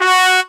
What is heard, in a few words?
Brass; pitchglitch samples; Ernestom81